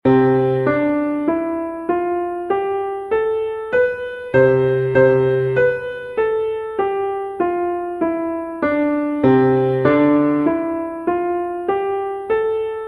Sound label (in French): gammes modes